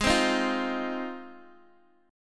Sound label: G sharp 6th G sharp down Guitar Open D, chord